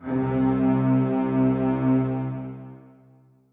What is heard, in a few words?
Yamaha TG-55 String Section C3